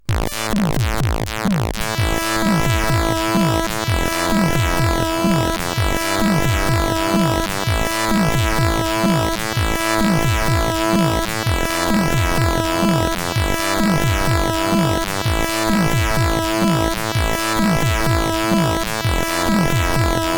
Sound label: Music, CSG8580R5